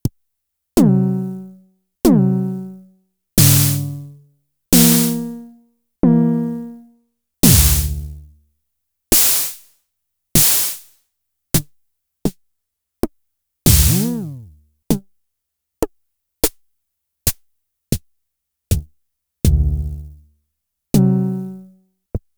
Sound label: Schlagzwerg Snares; Fricke